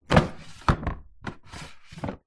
household washing machine detergent drawer tray slide open close; zapsplat